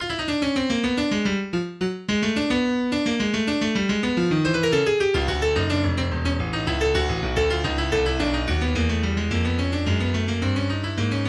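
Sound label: Fugue for 3 voices, MIDI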